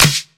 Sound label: clear snare drum sound g key; snare drum samples